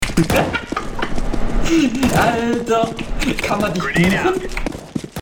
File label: hahahahahaha; kann man dich buchen; deranker clown